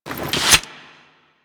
Axe Sheath; Warframe; Weapons

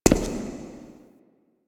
Shadow Figure Steps; Warframe; The New War; Zariman Shadow

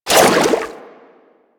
Aqueous Discs Hit; Warframe; Yareli

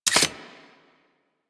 Uzi Pistol Mech, weapons; Grn Uzi Pistol

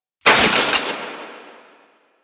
Flak Cannon Movement; Grineer

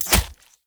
Throwing Knives Impact Flesh; Weapon Impacts; Player; Combat

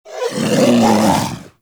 Predator Vocal Alerted; Warframe; Hunting Critters

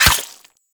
Melee Dagger Impact Flesh

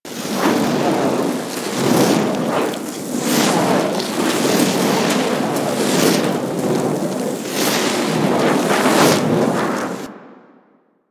Immolation Loop; Warframe; Ember

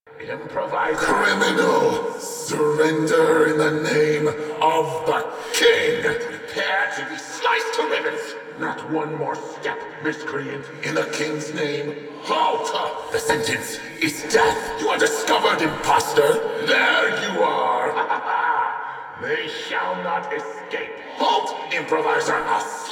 Searching Walla Town Street Loop

